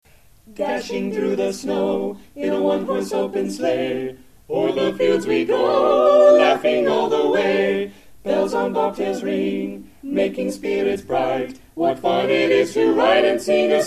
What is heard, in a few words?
Carolers; Cleveland